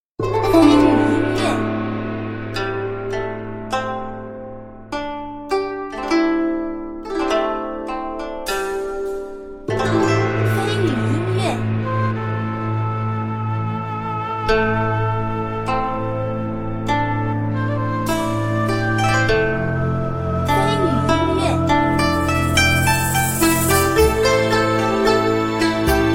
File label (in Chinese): 城市-古风-抒情